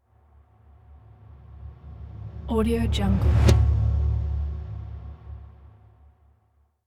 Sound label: cinematic impact